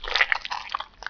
crush guy; Emperor - Battle for Dune

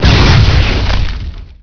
explosion; ordos; Emperor - Battle for Dune

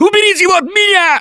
scream while pounced; Gambler; russian

